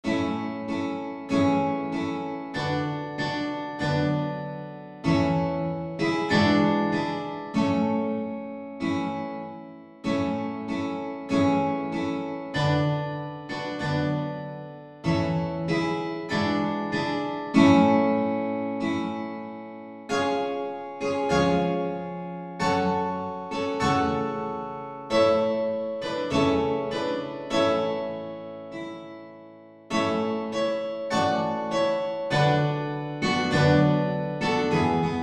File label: 5 guitars; Guitar quintet; Aura Lee; George R. Poulton